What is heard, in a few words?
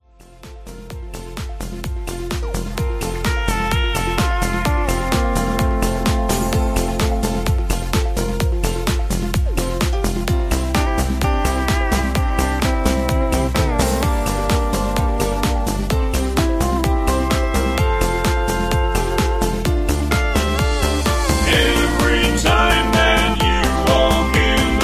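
Instrumental with background vocals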